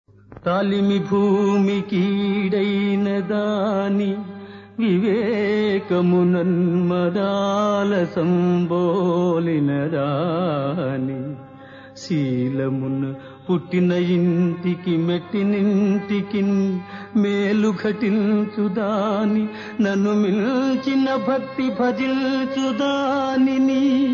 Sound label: Padyam; Chintamani